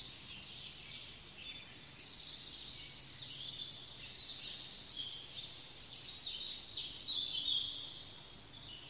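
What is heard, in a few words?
soothing sound of birdsong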